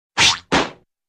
swish boing and land

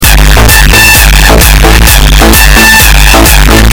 cowbell beat

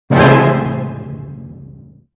suspense orchestral

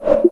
xbox scroll left right

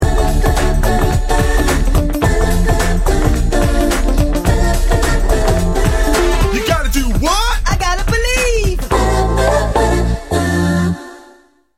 jazz

